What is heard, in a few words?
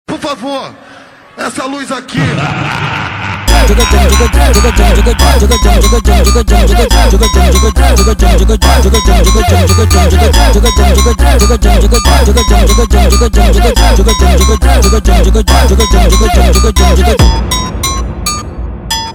Funk, Brazilian Phonk Groove